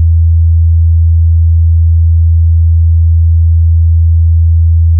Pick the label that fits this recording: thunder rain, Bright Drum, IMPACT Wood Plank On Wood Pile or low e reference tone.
low e reference tone